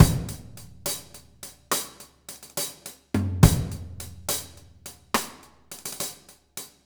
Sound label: SloMo Beats, Loops 140 BPM